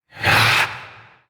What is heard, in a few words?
Possessed Red Veil Vocal Attack